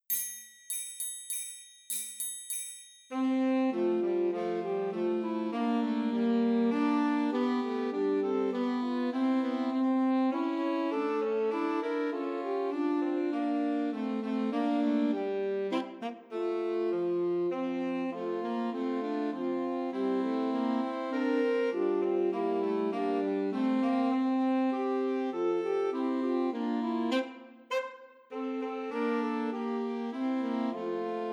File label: Two Altos and Tenor Saxophone; Christmas Trios